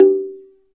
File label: Slit Drums, Africa, Wood & Metal